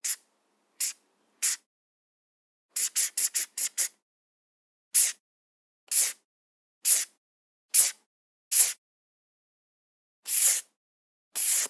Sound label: Organic Marker; boom library; The Interface